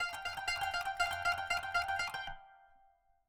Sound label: kanun